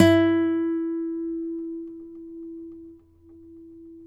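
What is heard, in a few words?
nylon, FLAMENCO, Guitars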